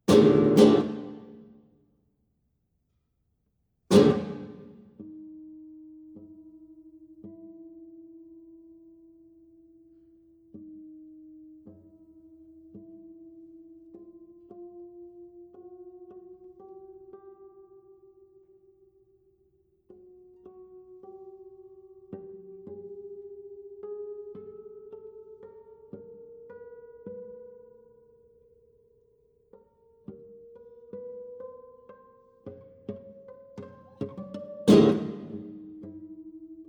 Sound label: Microtonal Studies Battuto e Misterioso